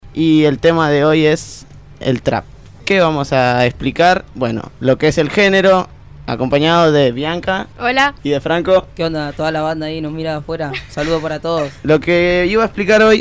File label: Trap